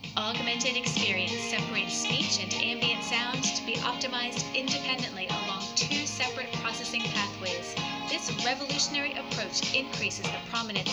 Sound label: music with traditional HA; Signia AX